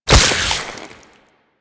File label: Infested Face Hugger Latch; Warframe